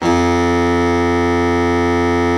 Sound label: BARITON, Sax; Giga Samples Collection